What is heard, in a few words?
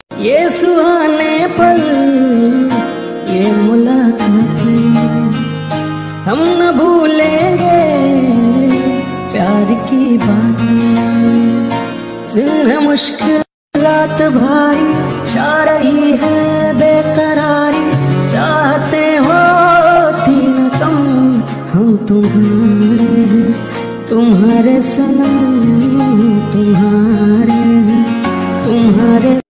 Old Hindi Song Ringtone